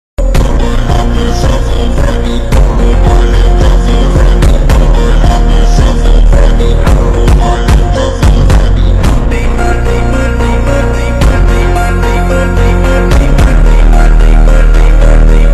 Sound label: Slowed Reverb